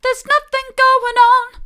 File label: theres nothing going on mid, vocal